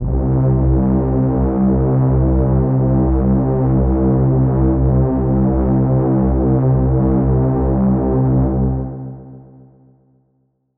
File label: big synth string